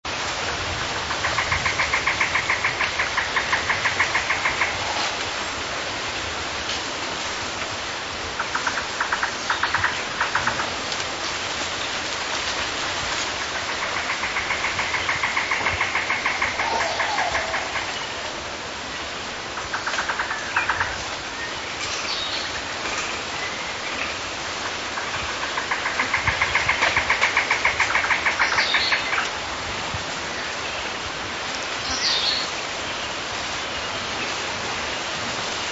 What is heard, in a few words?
black headed trogon, birdsongs, belize